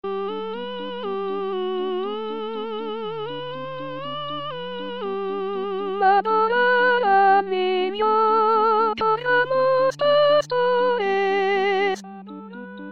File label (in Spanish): adorar al nio sopranos